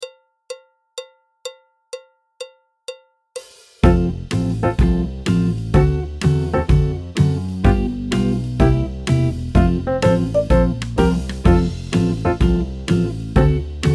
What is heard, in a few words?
clarinet, flute; Jazz Syllabus Level 4